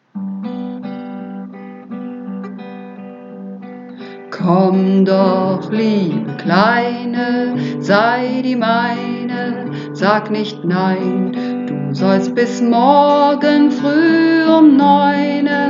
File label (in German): Hauptstimme